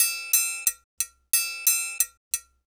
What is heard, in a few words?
Cajun Triangle; Sonic Foundry (Sony Creative Software); Joe Vitale Latin Percussion